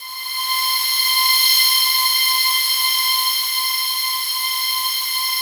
SLOW STRINGS

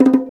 PRC, Bongos

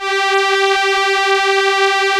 STR ELKA, Elka Strings, Elka Violins

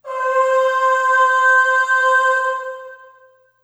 Aah Short C4 Short Reverb; Harmonic Building Blocks

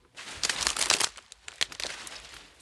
Trudge Step, outdoor, Footsteps

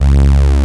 Taurus II, Moog Taurus; Greytsounds VOL-1

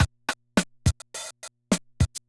Loop, classic machines, rpm 40

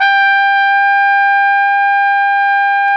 Flugelhorn, Solo Brass

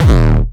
909 Grime D1, Kicks; Mbase 11 Kicks

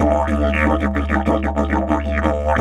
Didge 93 BPM, Didgeridoo

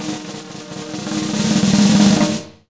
100 B BEAT, Jazz Latin Drumloops; USB Soundscan vol.08; AKAI

